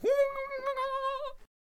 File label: Jester Attack Vocals